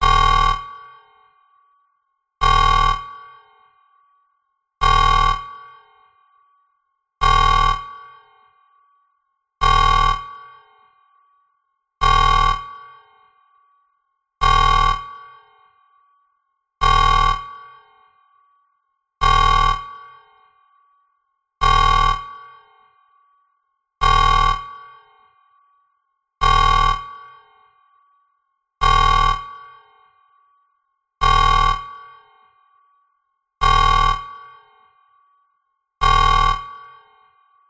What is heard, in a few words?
science fiction alarm big warning meltdown wailing deep tone slow